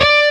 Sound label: bend full